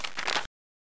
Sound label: PAGE; InventorLabs Technology